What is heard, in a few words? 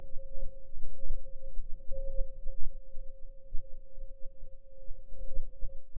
soft electronic hum fadin; electronics